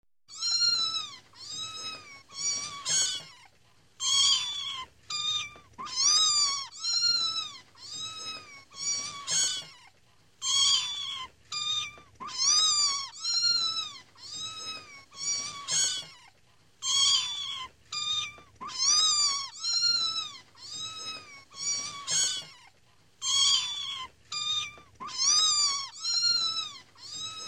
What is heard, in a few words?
Meowing kitten long